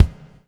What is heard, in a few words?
BUBL, Backbeat; Spectrasonics - Backbeat